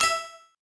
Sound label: Collect